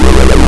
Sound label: tank fire